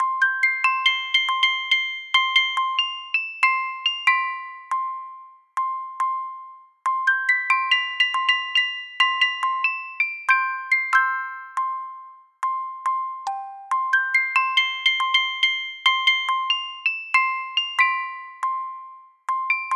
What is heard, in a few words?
Calypso, Music Box; animal crossing new leaf welcome aamiibo soundtrack